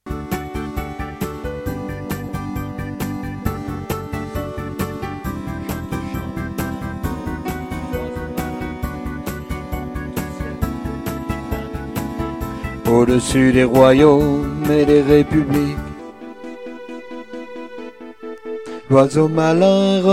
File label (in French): Basse Oiseau malin